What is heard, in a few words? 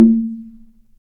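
Slit Drums, soft; Roland LCDP11 Africa VOL-1; Wood & Metal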